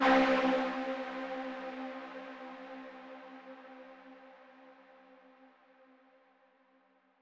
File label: pluck typhoon; Synthetic's Serum Collection